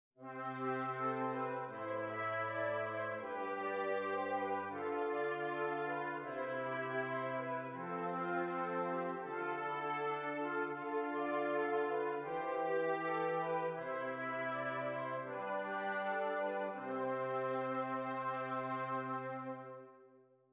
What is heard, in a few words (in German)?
Stimmiger Contrapunkt; Ronge